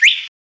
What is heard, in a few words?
banana hit; Nick Summer Sports Stars